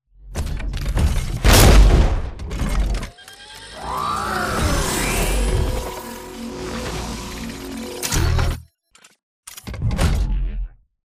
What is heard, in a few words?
dish catch; Titanfall 2